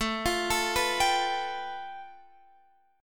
A 7th Suspended 2nd Sharp 5th, Guitar, Half Step Down